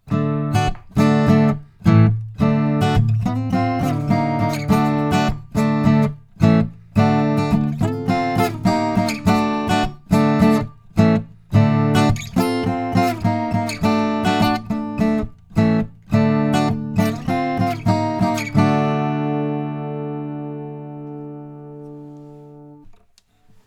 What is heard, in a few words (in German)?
Akku; GAP-EQ73